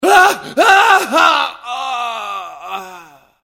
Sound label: infected; csgo